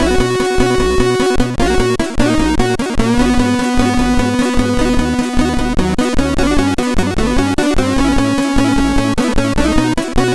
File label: Ballad, MOS6581R2